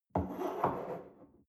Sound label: espresso place on table slide soft, restaurant cafe